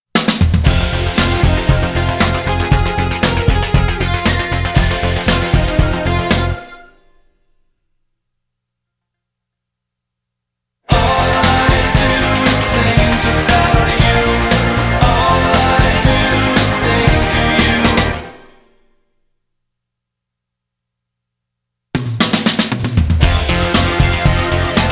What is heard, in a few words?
Full Mix Distortion